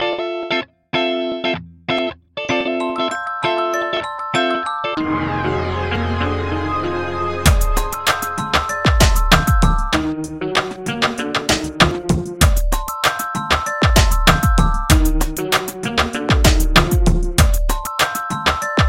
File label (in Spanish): guitarra orq 96bpm